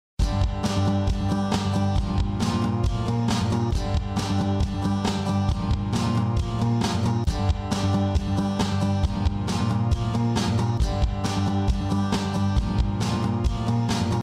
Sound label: GarageBand Test